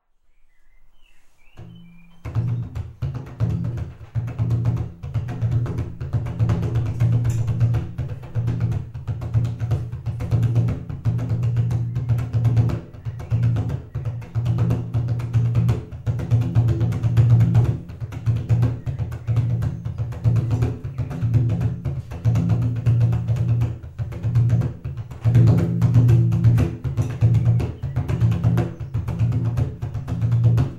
papa drumt traag

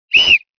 fluitje

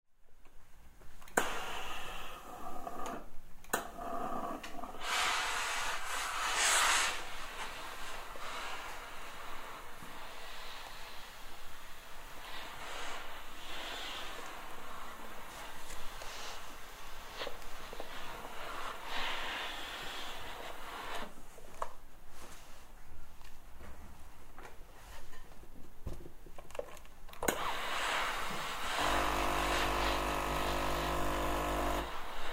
stoomstrijkijzer

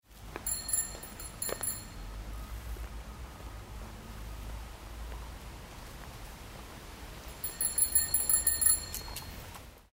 fietsbel

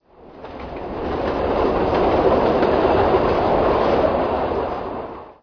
trein

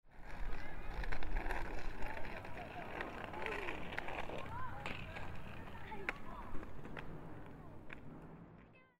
skaten op het plein

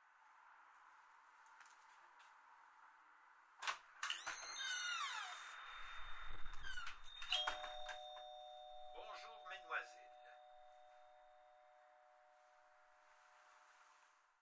winkelbel

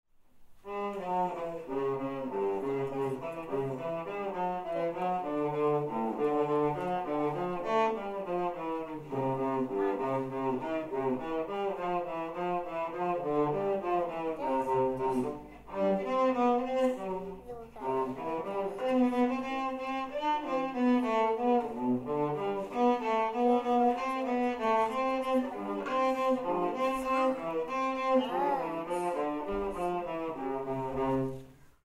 gil speelt cello

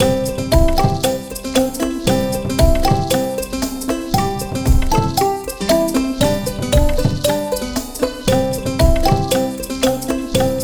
lied doemdakadoem instrumentale versie

